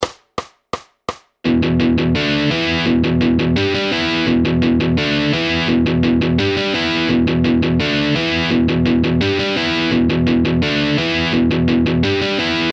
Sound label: 170bpm Fast Power Chord Riffs in E minor